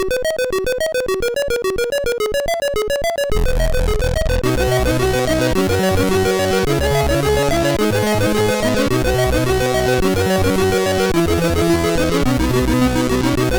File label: sid, MOS6581R3; Wonderfull Days